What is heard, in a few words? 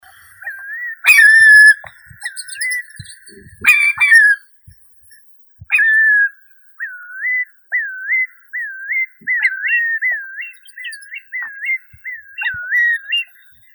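Far Eastern curlew, Numenius madagascariensis; kronshnep dalnevostochnii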